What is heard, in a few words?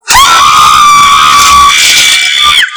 impaled scream; htf flaky